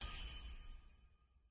Attack Hammer

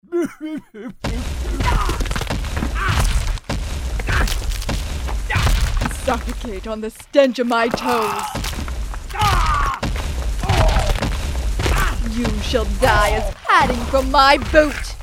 insole Walk Attack; Goddess of Trampling; Jeanna

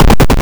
lawnmover break

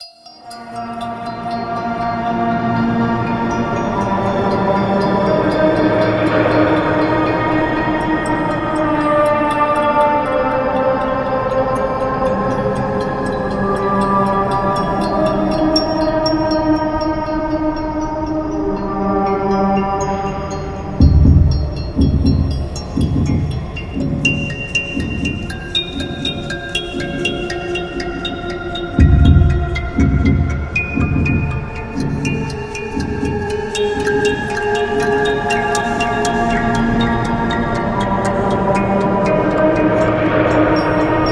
Radio Music; let it die

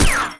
Ricochet

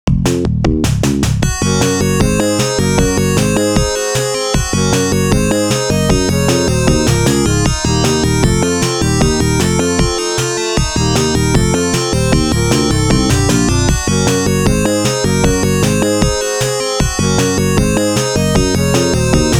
Taking Off; バーニングフォース; Area 3 Demo

